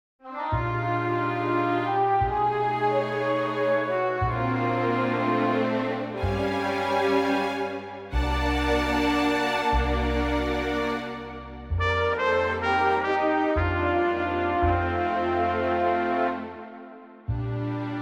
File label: Bb orchestral